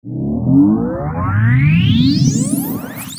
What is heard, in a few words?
ship ascend; ships movement; CosmicRage